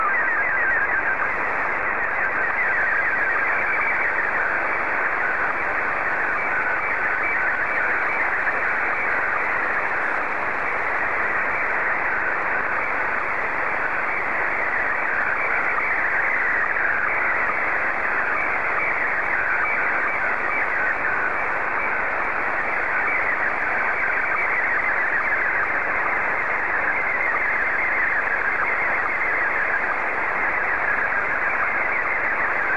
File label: sstv; VK4MSL SSTV RX @ QG62ln